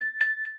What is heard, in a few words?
Keys Keys; toque de celular